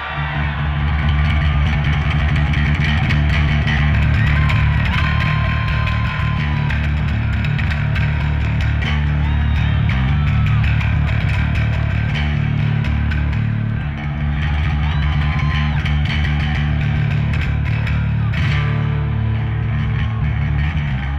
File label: Solo; Too Heavy 4 Halftime; AT&T Park